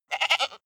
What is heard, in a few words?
goat baa stutter male